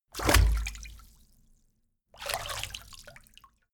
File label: walk foot in out